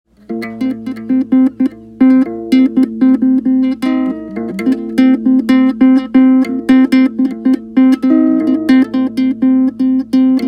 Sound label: Concerto For Untuned Guitar